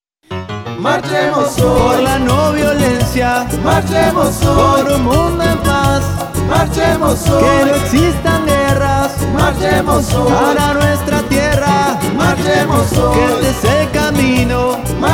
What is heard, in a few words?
jingle